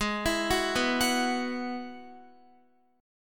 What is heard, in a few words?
Ab Minor 7th Sharp 5th, Guitar; arpeggiated; JGuitar